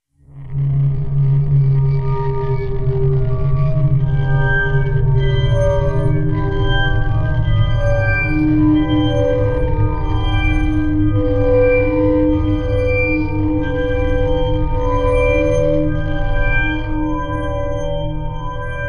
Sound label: Holy Shimmer